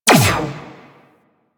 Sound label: Laser Fire; Warframe; Teralyst; Vomvalyst